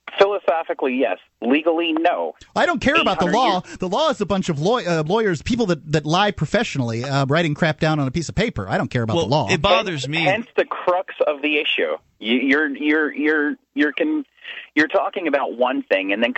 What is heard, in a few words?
haha